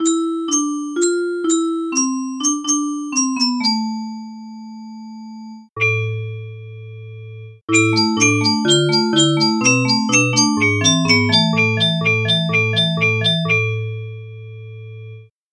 music box

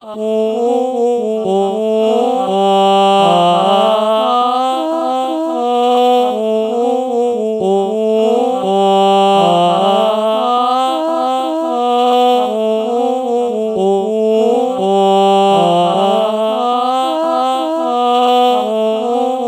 ah oh melody morph low

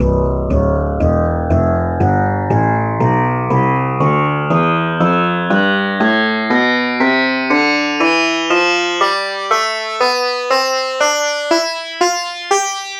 sitar tonleiter

